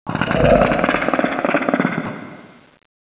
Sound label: dead tree